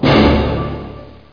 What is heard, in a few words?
dying; rott